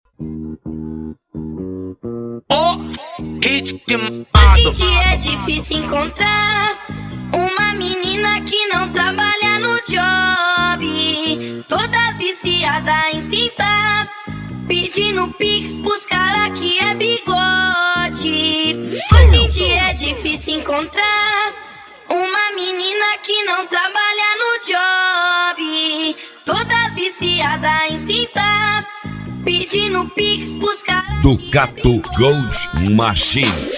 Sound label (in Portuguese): especial funk